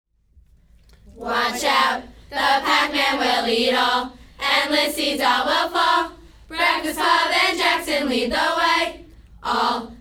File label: cheers